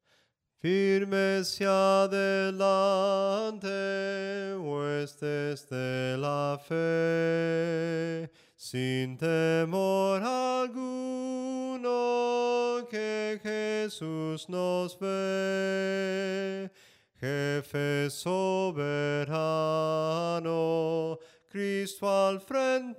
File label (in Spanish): SOPRANO CANTADO, himnos en melodía soprano; cantos del camino